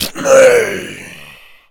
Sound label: Pain, Zombies; zps; Carrier